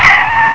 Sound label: skid